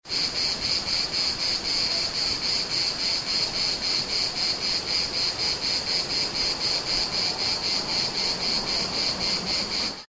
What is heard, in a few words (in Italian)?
Cicale di montagna; Ascoltando il bosco